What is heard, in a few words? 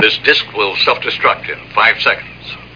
Selfdestruction; BP Viewer; VMTuner